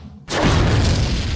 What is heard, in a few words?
hit loud; wyzen